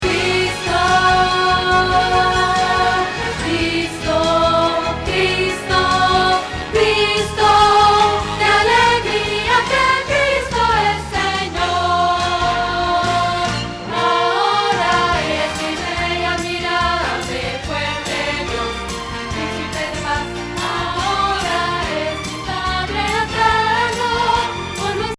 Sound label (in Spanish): Contralto